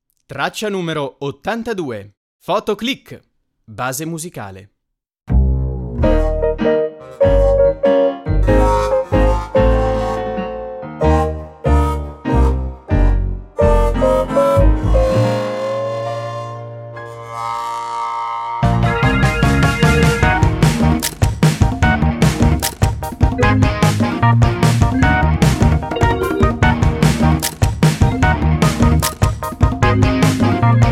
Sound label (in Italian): foto click base musicale; infanzia